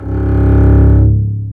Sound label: STR ARC BS, Cb Bowed, Cb1 mf vb